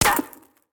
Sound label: gun miss; Blind Drive